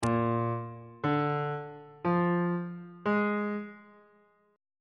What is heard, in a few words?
d locrian 7th chord 6 is b flat dominant 7th on bass clef